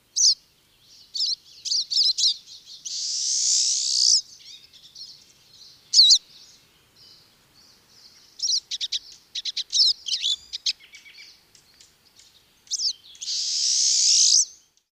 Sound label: pine siskin